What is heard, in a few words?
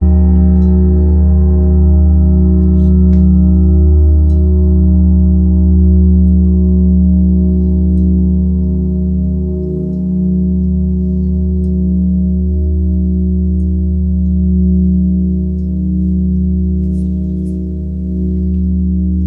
Ti Bell